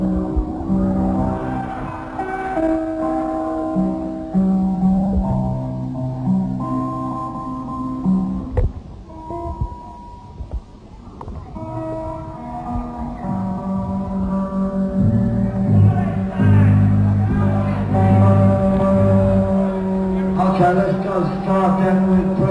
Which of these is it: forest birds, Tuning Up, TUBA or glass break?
Tuning Up